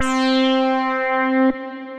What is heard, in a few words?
Wish U Were Lead, Synth Lead; Ableton; Factory Packs; Synth Essentials